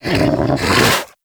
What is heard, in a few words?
Snow Critter Vocal Alerted; Hunting Critters